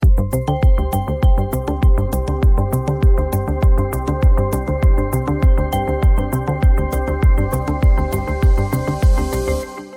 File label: bgm